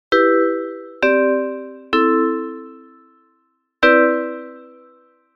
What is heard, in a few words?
midi delay 0; poppo-ya